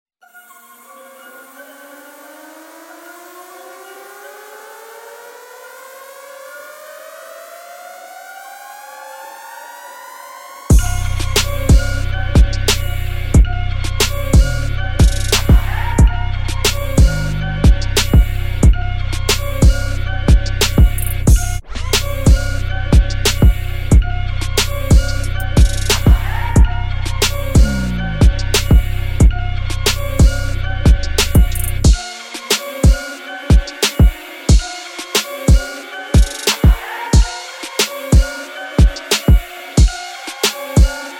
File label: Instrumental